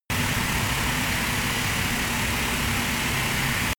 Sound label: spinning rattle, sciene fiction